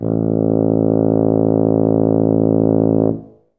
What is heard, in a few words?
tub A0, Tuba; Best Service ProSamples vol.51 - Classic Orchestra 2